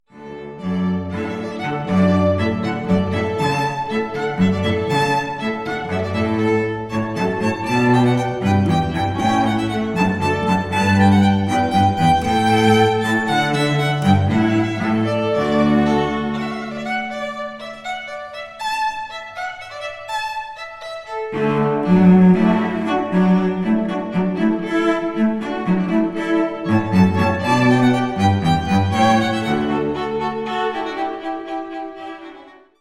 Hornpipe String Trio; Handel; Wedding Music; Ann Arbor, MI; White Pines Entertainment

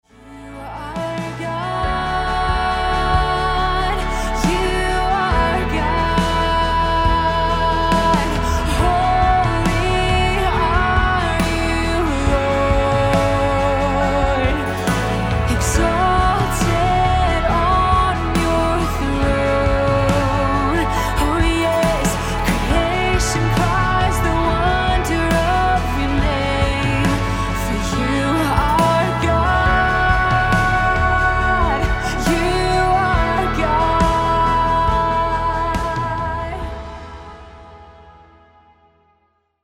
DRUM BOUNCE

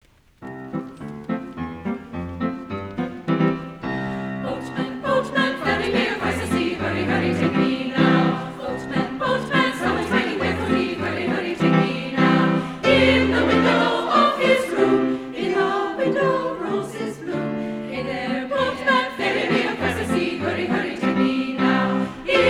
Three Hungarian Folk Songs

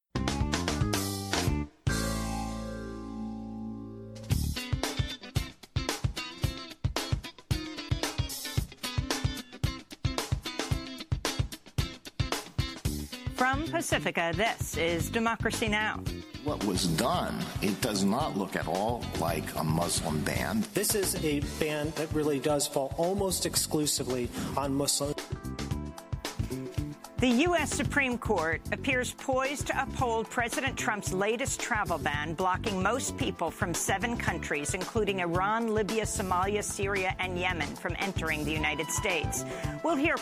outro

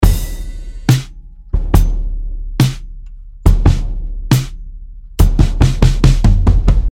140 CANNON BIG GROOVE LOOP C

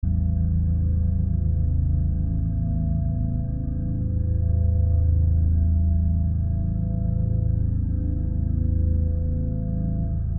Space Symphony 27Hz

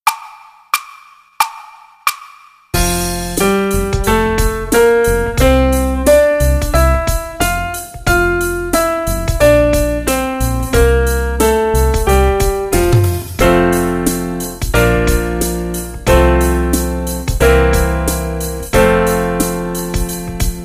scale and cadence in F; F Major; Wagner Book 3

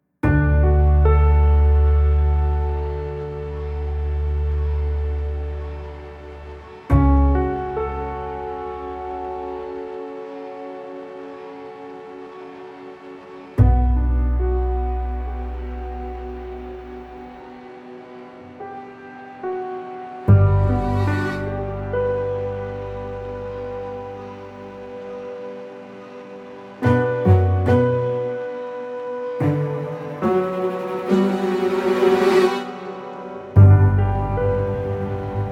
Piano Theme

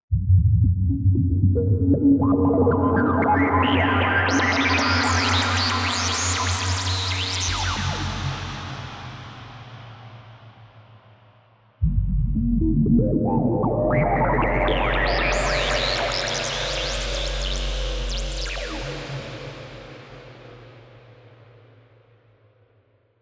Synth Mercury Descending Descend

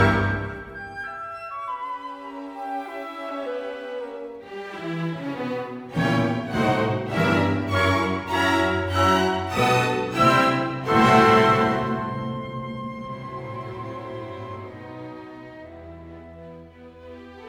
Piano Concerto No. 1 in E Minor, Allegro maestoso

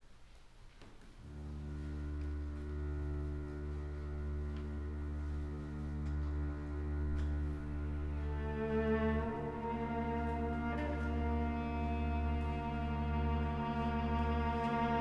Concertino for Viola

